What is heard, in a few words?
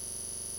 Bulb Loop